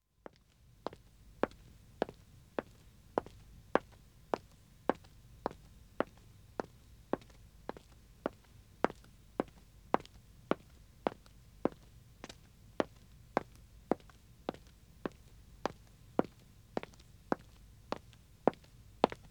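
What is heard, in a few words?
Wood (Dead Acoustic) Walking 1 woman, Footsteps; BBC Sound Effects Library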